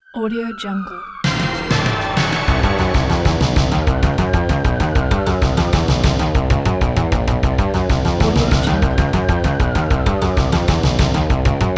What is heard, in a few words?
80s action chase